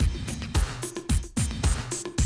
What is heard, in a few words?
110, Classic Drum Loops